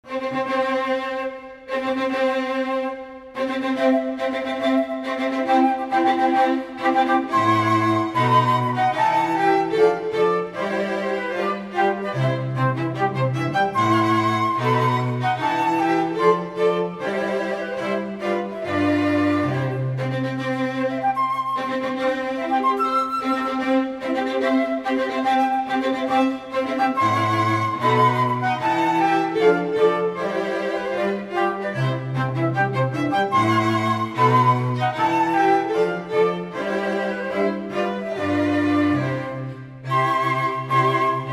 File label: Wedding March, Flute Quartet